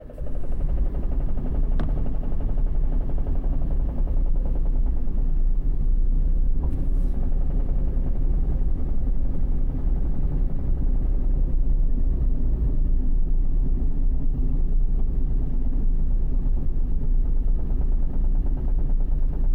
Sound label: clatter, insight